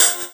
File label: Chords Tones; Equipped Music; Loungin House